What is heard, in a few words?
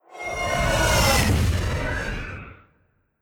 Necro Resuc Spell